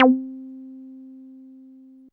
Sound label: Dance Floor Bass, Multisample Basses